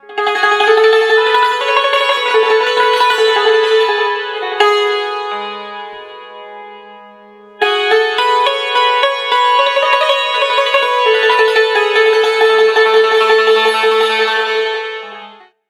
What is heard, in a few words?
suntur, stringed instruments; Sonic Foundry (Sony Creative Software); World Pop